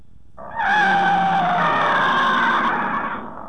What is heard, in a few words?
roar; Gamera's roar 3; Gamera heisei